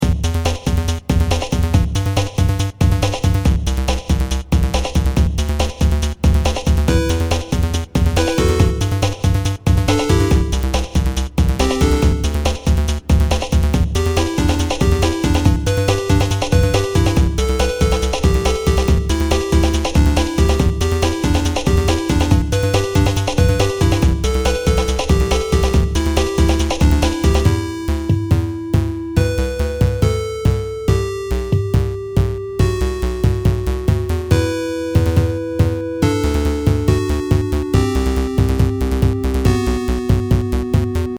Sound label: 8-bit hectic